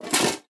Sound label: armor hit; minion battlefoley; smite; npc; roman archer